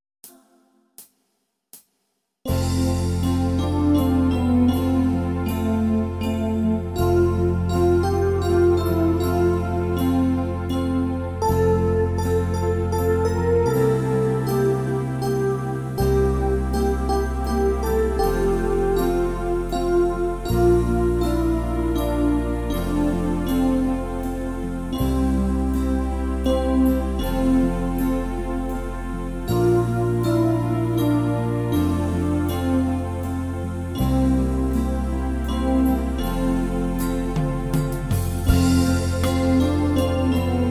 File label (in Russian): Колыбельная